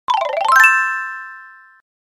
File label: correct